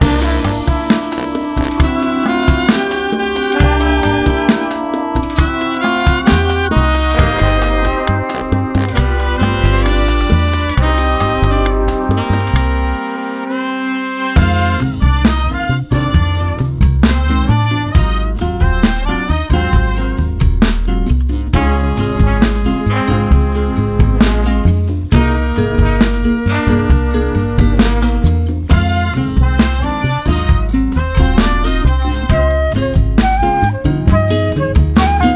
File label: Latino Brass Parade; JoosTVD; For Your Pleasure Vol2